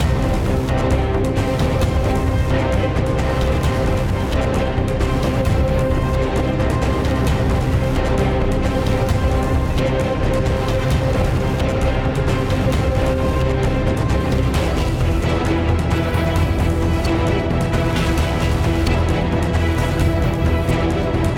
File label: End Battle; There Is No Game: Wrong Dimension